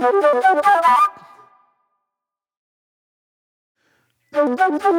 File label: Pop Flute